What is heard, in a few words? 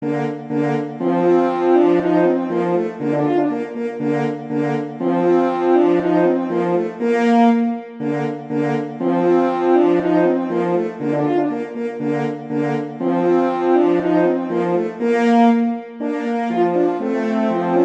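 Duet, horn; Mozart; Duets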